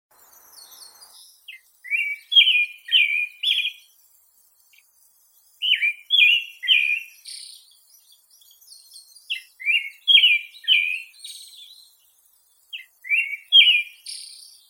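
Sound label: pale thrush turdus pallidus; drozd blednii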